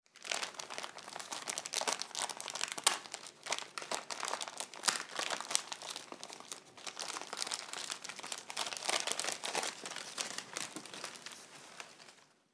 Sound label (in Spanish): abrir regalo